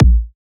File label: kick oneshot, Pop; Drums; SD PASADENA Pop Sample Pack; Slate